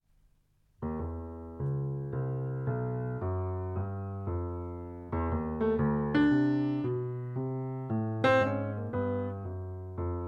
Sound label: FREE JAZZ, rhodes, drums, vibraphone, bass clarinet, trumpet, trombone